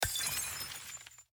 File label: glass break